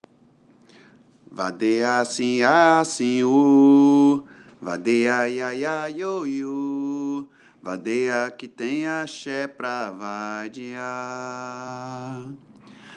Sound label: Sings a capella; Here’s a recording of him singing the melody a capella; Capoeira Music Practice Material; Vadeia Sinha Sinho